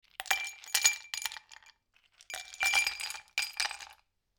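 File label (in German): eiswürfel; haushaltsgerausche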